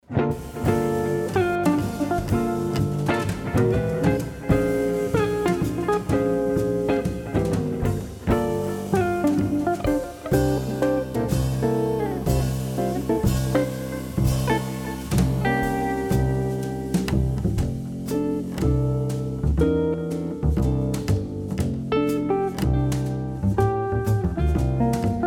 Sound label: guitar